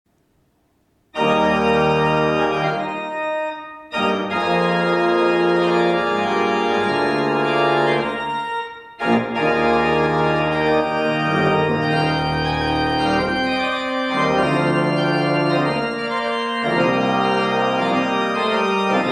preludium i ess-dur; bach; vestre toten

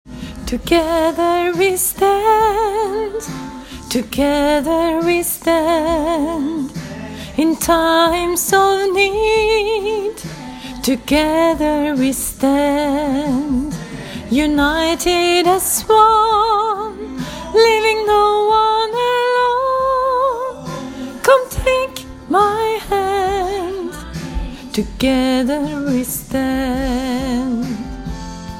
sopran; åfjord

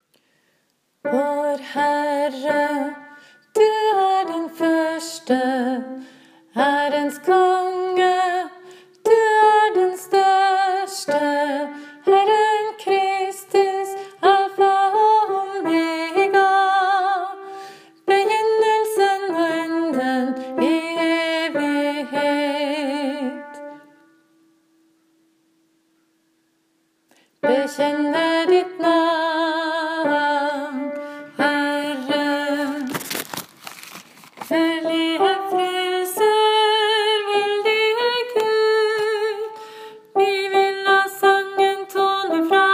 alfa og omega alt; åfjord

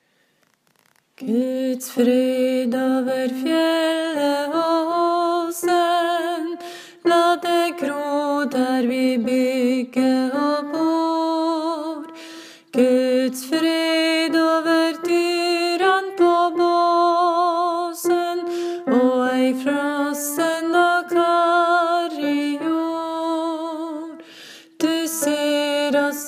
nordnorsk julesalme alt; åfjord

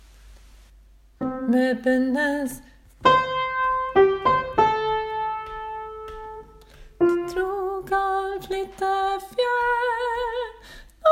kraft herrestemme vers1; åfjord

